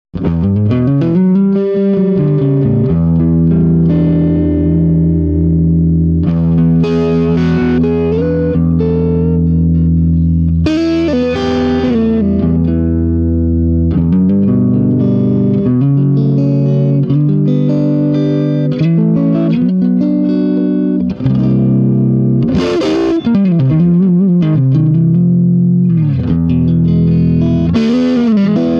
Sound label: Swart Amp; Swart Atomic Space Tone